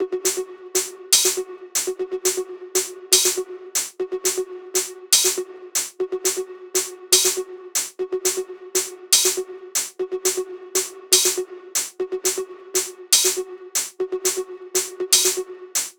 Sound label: tops 120, Drum Sample Pack Loops Top Loops; SD SEISMIC; Slate